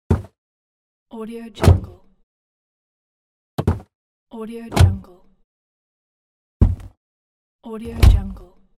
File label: car door open close